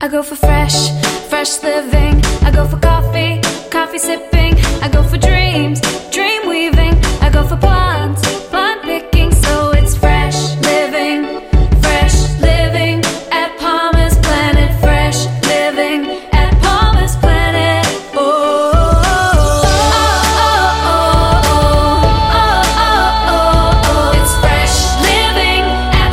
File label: Pop Female mix; Palmers Planet